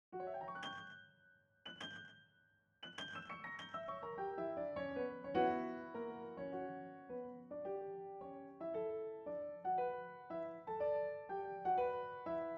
Piano

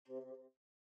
Bassoon

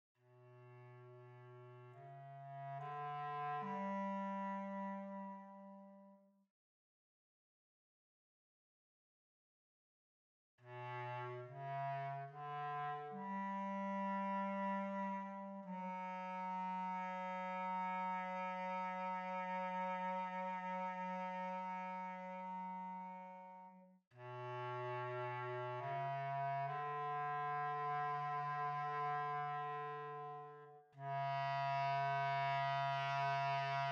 Bass Clarinet

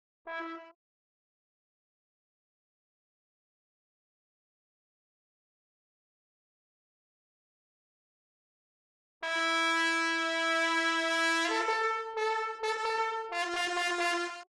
Trombone; Holst Jupiter

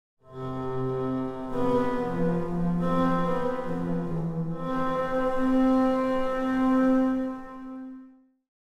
soundcheck Double Basses

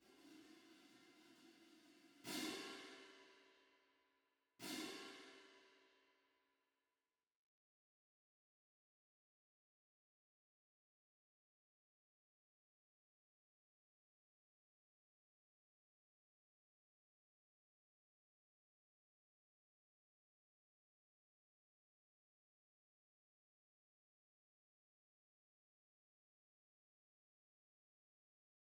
Cymbals

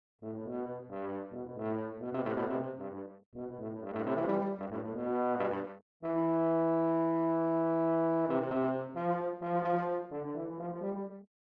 Tuba; Holst Jupiter

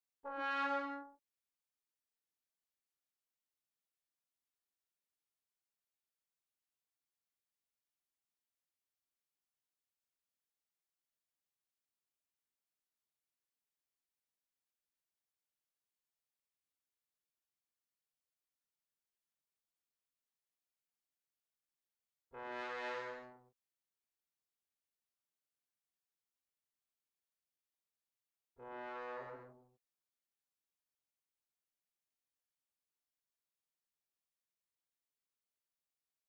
Trombone

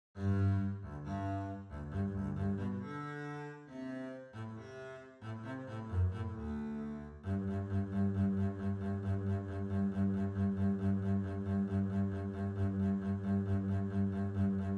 Double Bass; Eine Klein Nachtmusik prepared for ctools by Shegolev

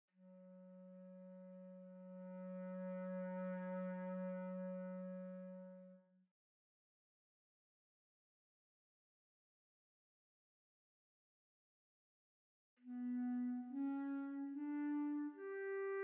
Clarinet

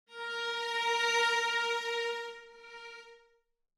Violas

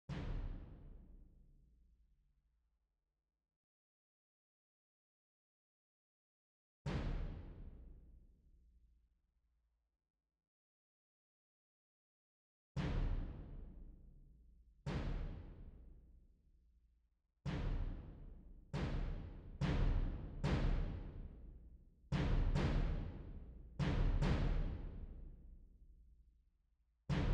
Bass drum

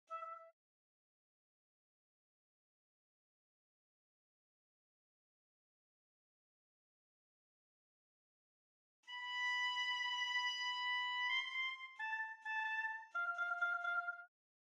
Oboe; Holst Jupiter

